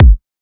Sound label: kick one shot clean, drums; Pop; Slate; SD PASADENA Pop Sample Pack